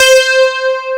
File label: PIANO C4; AKAI S-Series CD-ROM Sound Library VOL-2